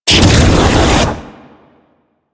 Flame Thrower Ignite; Sargas Ruk Flame Thrower

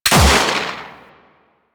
Revolver Pistol Shot

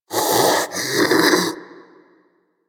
Armadillo Vocal Breathe; Warframe; Hunting Critters

